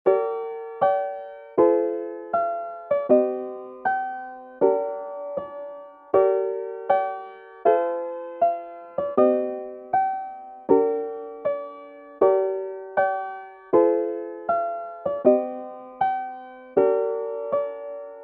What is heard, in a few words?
F min 158 BPM Piano Keys